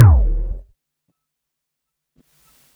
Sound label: Quasimidi 309, Drums